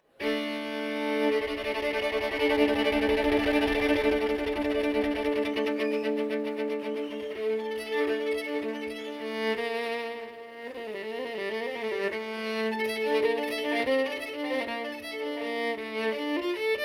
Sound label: Violin; ensemble hubay